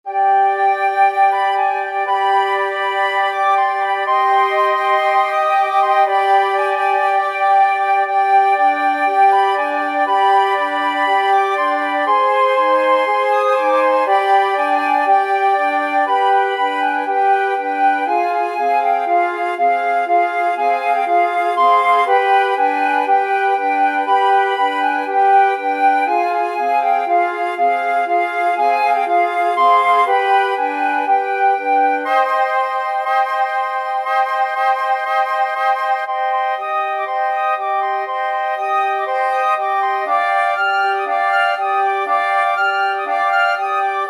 hava nagila, flute quartet

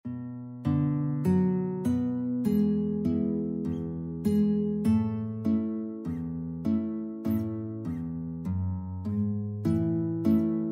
fado 2 guitar

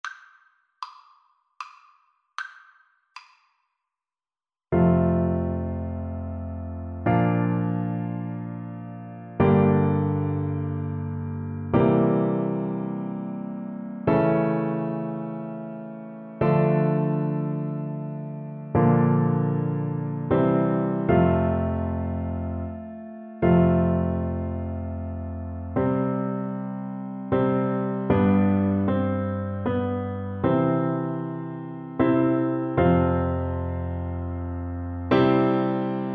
we wish, Alto Recorder

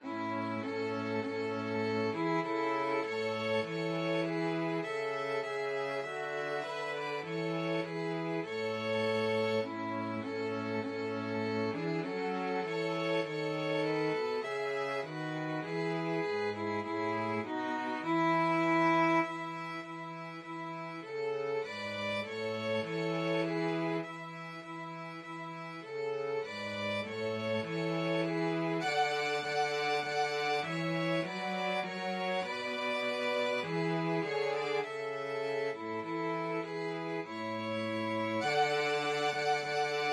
violin cello trio; 2 VLN 1 VLC

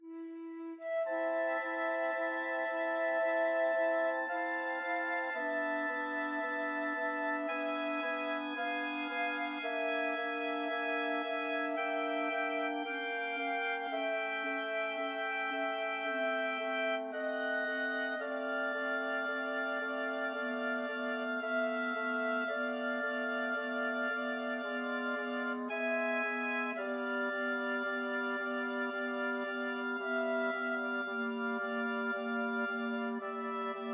flexible mixed ensemble, 4 players

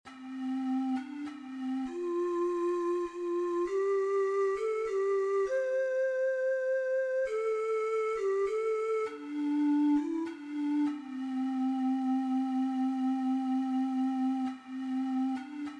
ocarina four hole

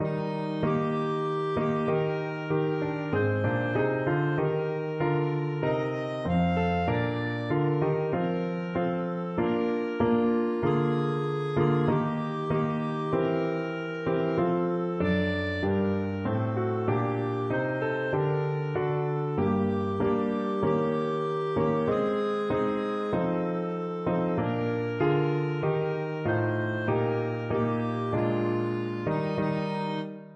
clarinet; Navy Hymn

